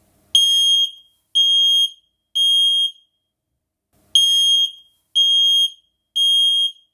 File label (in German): Alarmton, rauchmelder